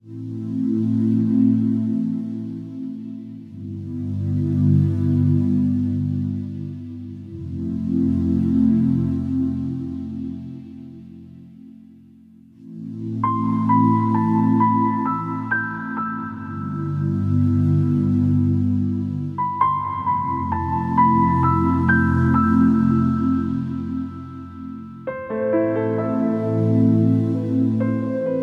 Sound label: In Rain